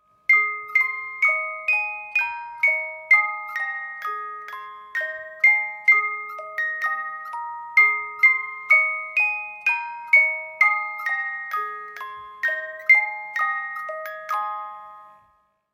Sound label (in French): hymne a la joie, boites musique